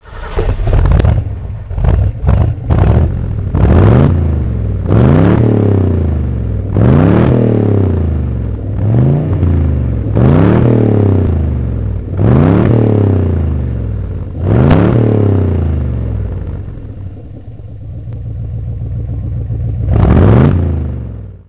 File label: pantera